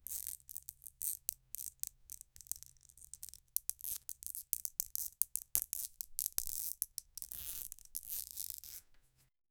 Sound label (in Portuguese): jogando um novelo